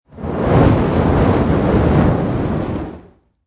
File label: Fire Flame Movement Heavy, Heavy fire movement, fire burst or torch swipe through air with hea; fire burst or torch swipe through air with heavy flame whoosh; Flame Sound Effects